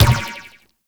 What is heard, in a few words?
Ball Bounce Rock; Warframe; Tenno Ball; Missions